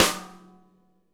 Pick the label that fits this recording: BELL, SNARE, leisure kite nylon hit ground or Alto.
SNARE